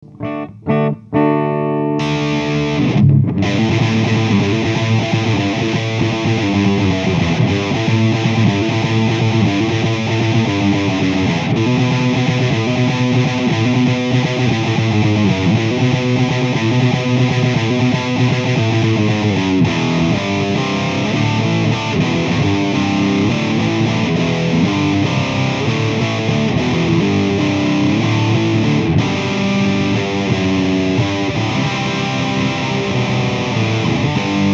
Hi gain, tube, LadyEvil

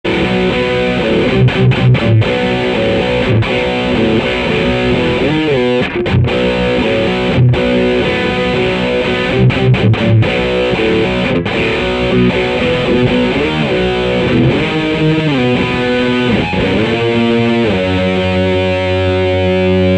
distortion; Dr Boogey

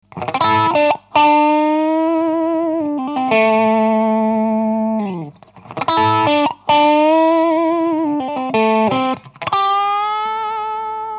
maxGain, distortion, Klon

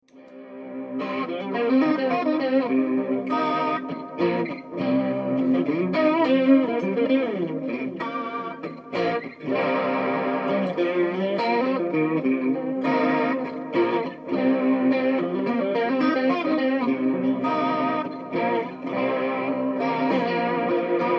Speedster easy, modulation, VB-3

